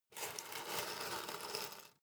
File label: paperclips pick up slide